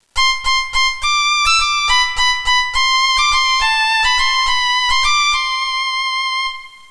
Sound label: violin; Talking E-Mail